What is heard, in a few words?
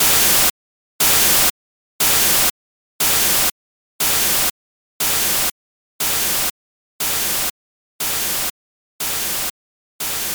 white noise example